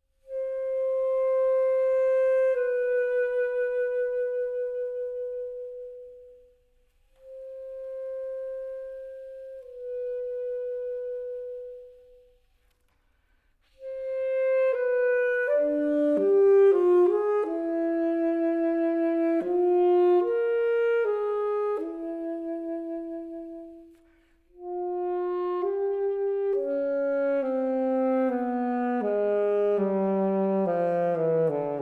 Saxofone Alto

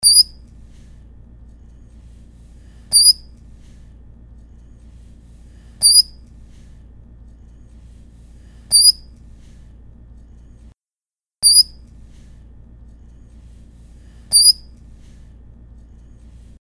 PIO Entonação tímbrica

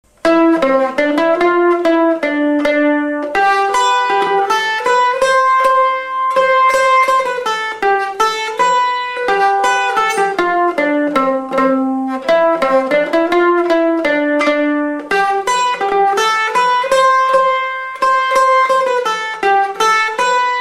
Bandolim

